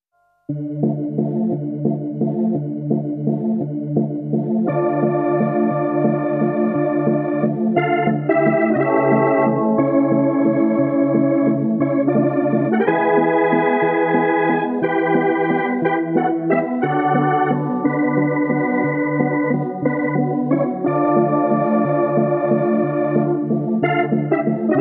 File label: Organ